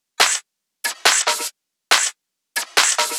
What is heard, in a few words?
drum Loop 140BPM Perc